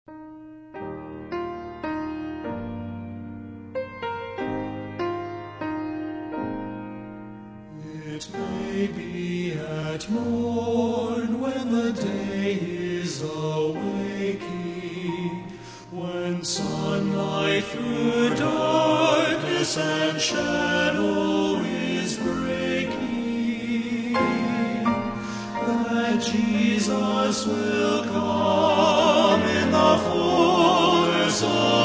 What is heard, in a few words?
Men's Quartet